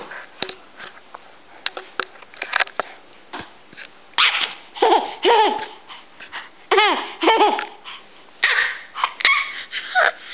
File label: ahaahaa